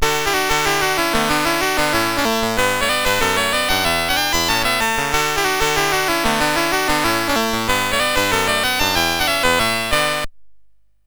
sid CSG8580R5; Metro-Cross

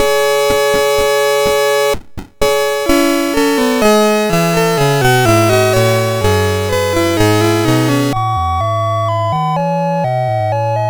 sid MOS6581R4; Arcana

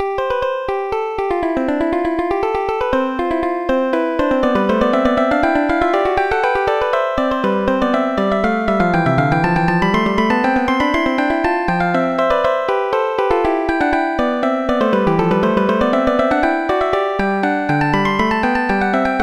prelude; The Music Studio; MIDI

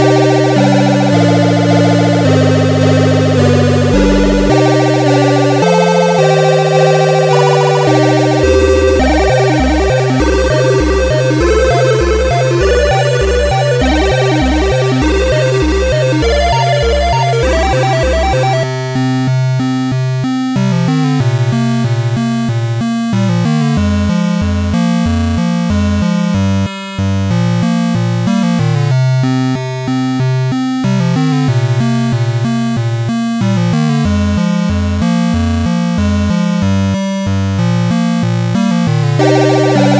synth, OctaMED MED

